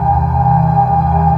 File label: SUBTLE MAC; MACHINES